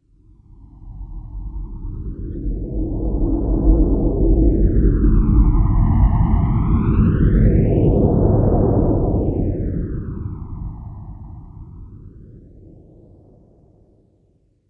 Science Fiction Spaceship Pass By Whoosh Flange Large; Spacecrafts